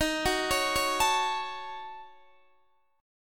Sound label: Eb Minor 7th Flat 5th, Guitar Open D